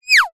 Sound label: cartoon throw high pitched